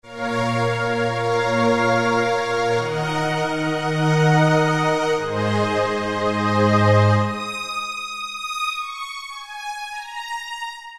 Synth Strings Hi; Roland PN-D50-03

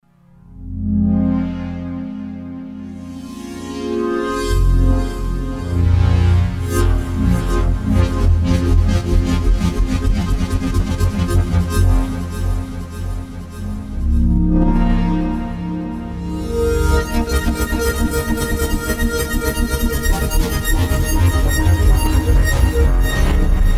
Vectoring Propht, Roland JD-990; Vintage Board

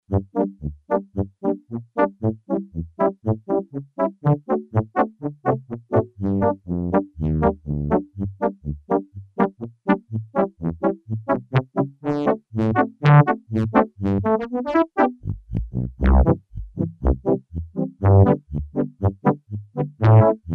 Brass Band, Yamaha TX802; Performances; Factory Patches

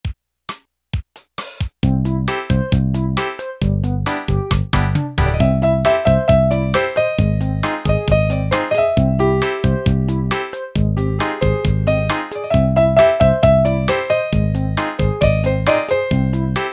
rock, Yamaha PSR-300 styles

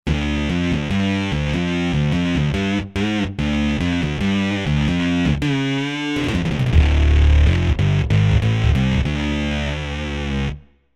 Bass Mean Bass

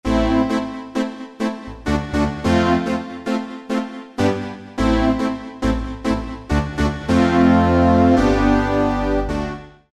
Yamaha TG33; SP-Full

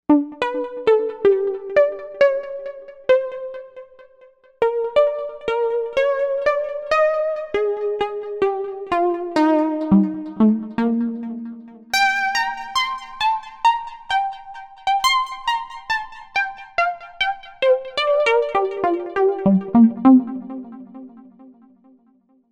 Firefly Pluck; Novation UltraNova; Factory Patches

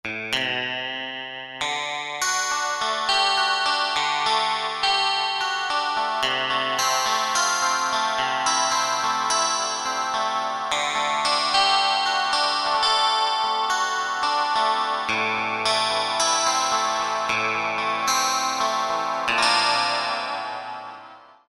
Electric Guitar, Roland JD-990; Factory Patches

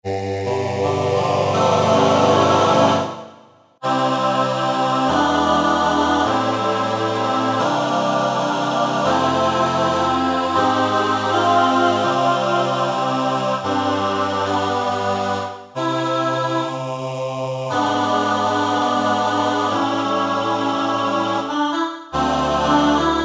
Pop Aahs

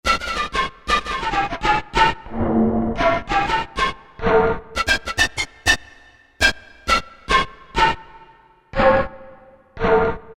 Breath; Roland U-220; Factory Timbres

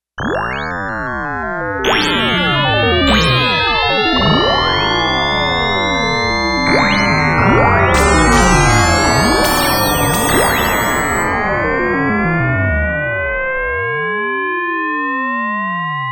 Three EMS; Digital Synthesizer

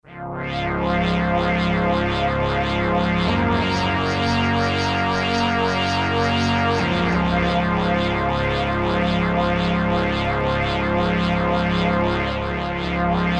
Bronze, Novation XioSynth 25

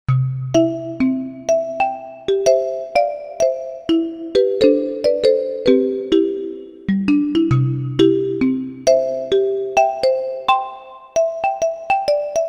Marimba; Korg MSC-1S Variety

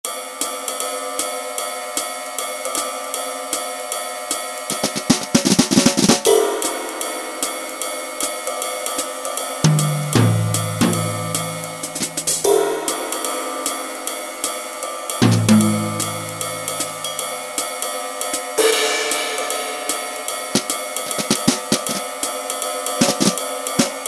DRUM KITS Jazz Kit; Apple GarageBand 2

